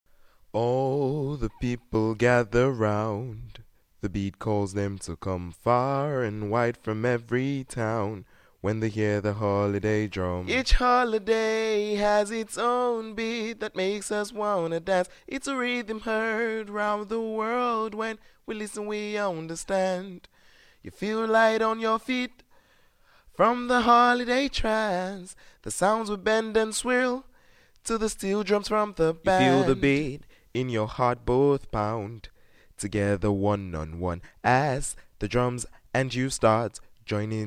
Holiday Beat reggae 103 AM

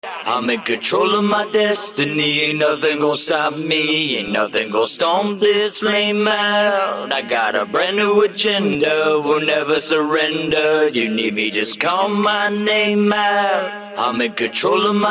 Chorus Only hip hop 140 Abm, Acapellas

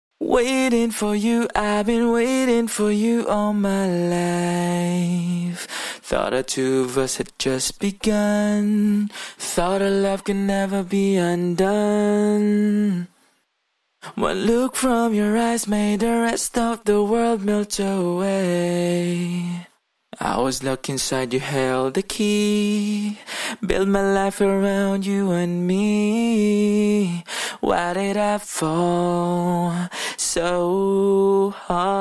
pop rnb 80 Fm

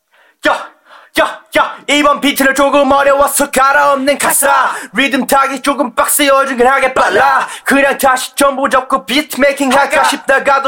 rap, 87, Dbm, acapellas; Dynamite

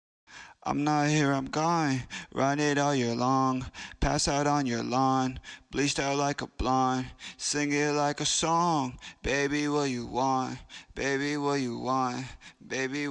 Cloudy Throat rap 140 C, Acapellas

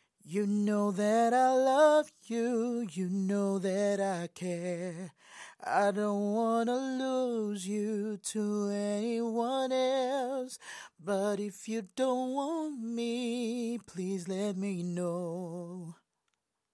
pop rnb 60 Cm

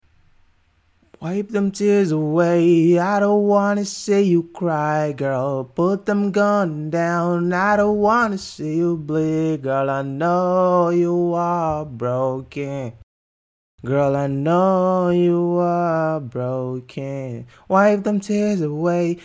chill, 150, Fm, acapella

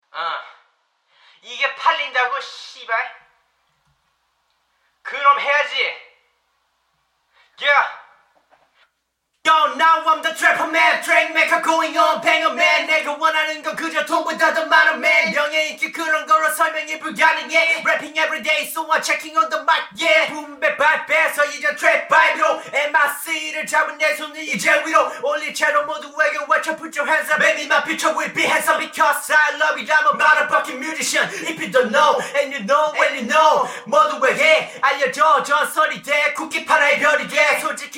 trap, 97, Em, acapellas

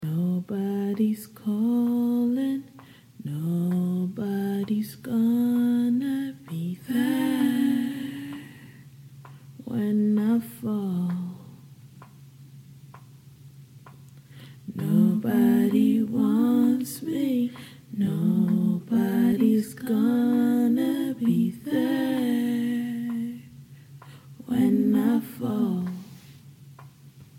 lo fi 65 Bbm, Acapellas

